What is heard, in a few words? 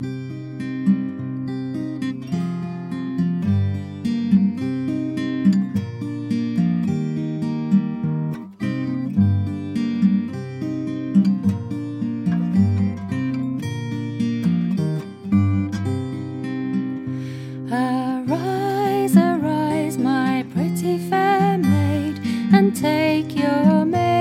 May Song; Northill